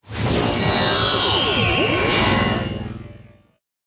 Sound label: attack; superheroes2; citizensolar